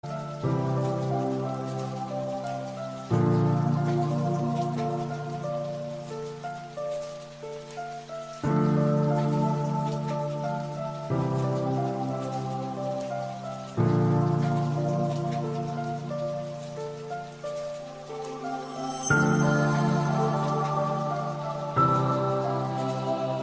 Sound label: nepali